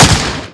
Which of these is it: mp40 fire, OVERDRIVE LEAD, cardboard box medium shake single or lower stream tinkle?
mp40 fire